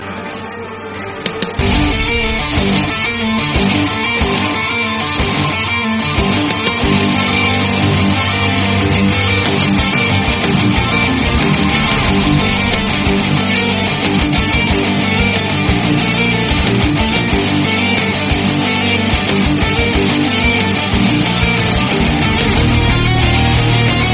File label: Metal; Walking Dead